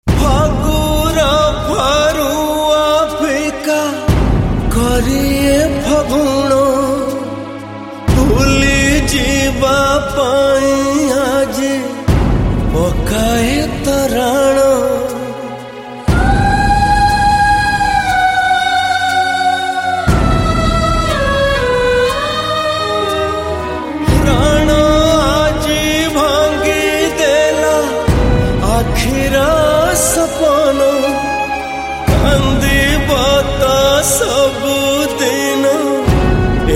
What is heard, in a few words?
Sad Male